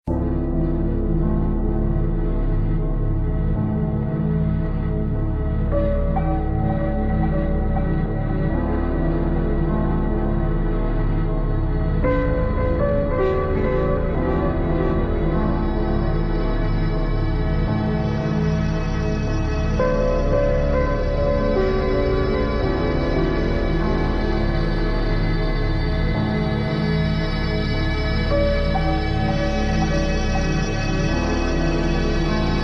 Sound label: game over; svencoop; sence; source of life